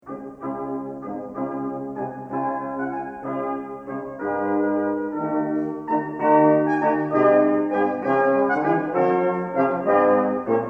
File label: fanfare